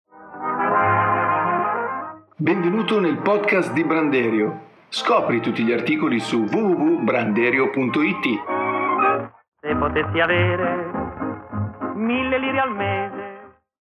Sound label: jingle podcast